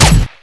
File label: usp shot